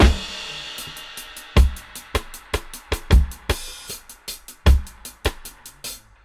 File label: 76 VRB, Dub, DRUM LOOPS, 076 BPM; Drumdrops In Dub VOL-1